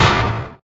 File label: steel bar door close; minetest game; xpanes